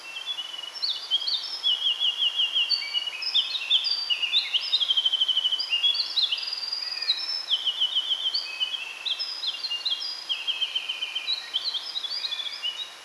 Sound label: winter wren slow